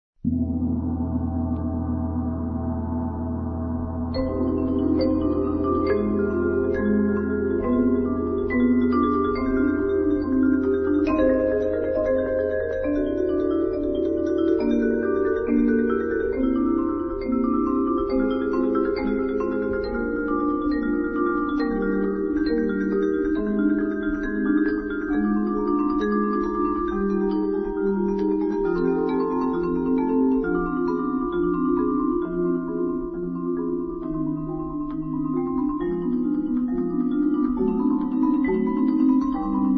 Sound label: prelude c moll; classic; jsbach